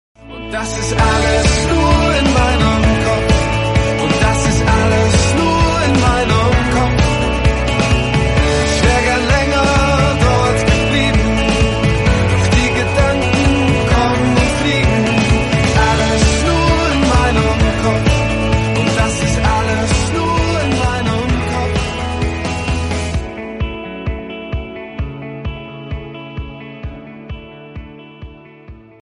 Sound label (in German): alter lied klingelton ringtone